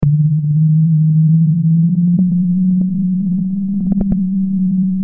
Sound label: horror